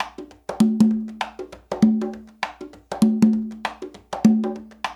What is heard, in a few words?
loop, congas; Percussion; InVision Interactive; Percussion Slam